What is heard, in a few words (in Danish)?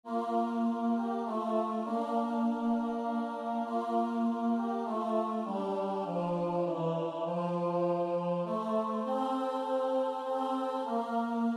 tenor; Glade jul; korsats